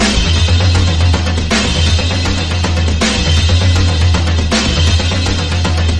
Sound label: drums; horde